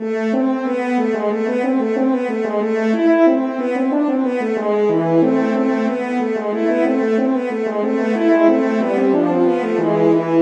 Vivace, horns; Telemann; Canonic

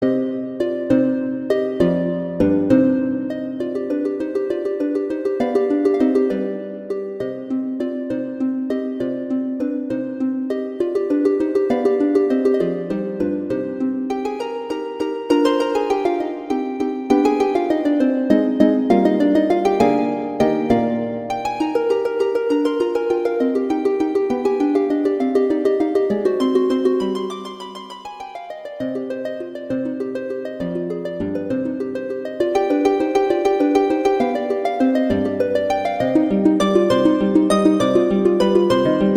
Sonata, Andantino; Dussek; Sonatas; Sonata III

